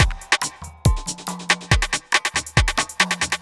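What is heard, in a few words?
industrial 140 kit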